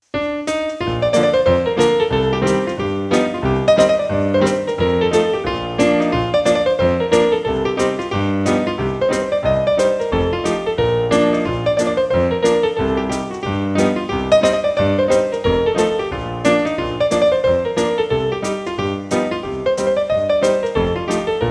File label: Hornpipe